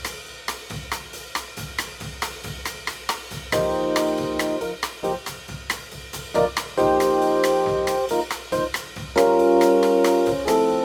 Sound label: Minor Modal Backing Track NO BASS